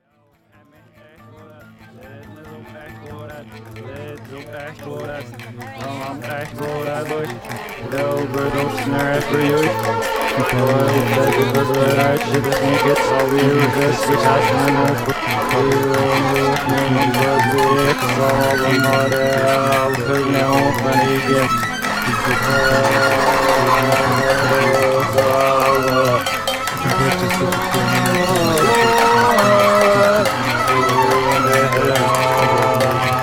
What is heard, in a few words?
Pendulum Blues